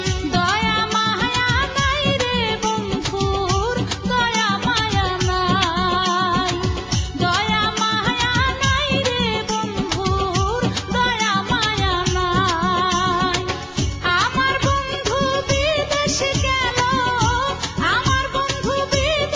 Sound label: Bangla Ring Tone